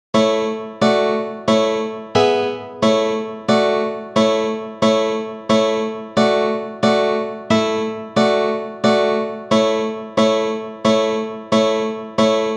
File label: Chords 180bpm, mandolin